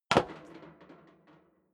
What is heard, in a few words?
step sneaker metal rattle stair down; schritte